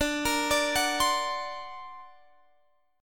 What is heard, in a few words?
D Augmented 7th, guitar